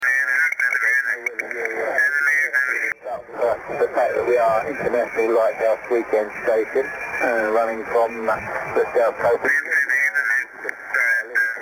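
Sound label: 40 meter phasing stereo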